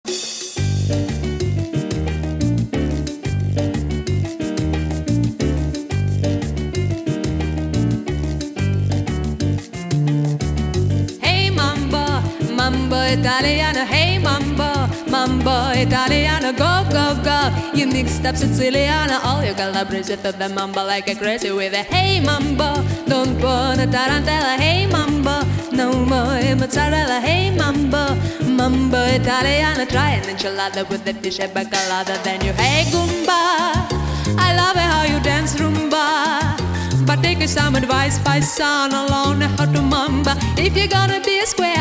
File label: mambo